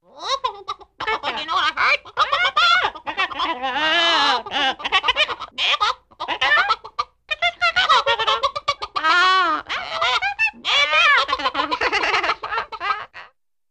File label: Funny Chicken Clucks, Laughs & Some Understandable Talking ( Not As Busy As Index 1 ) ( I.e. Chickens Gossip ).